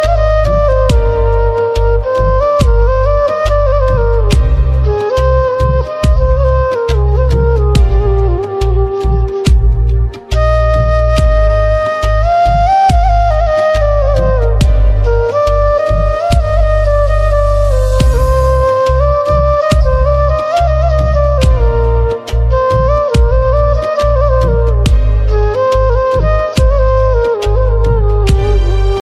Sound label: instrumental ringtone, bollywood